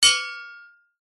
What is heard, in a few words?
impacts metal med small hit resonate